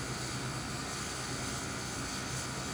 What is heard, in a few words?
on train; ambience; Soldier Of Fortune II Double Helix; XBOX; kamchatka